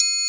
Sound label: TRIANGLE; Big Fish Audio; Synth City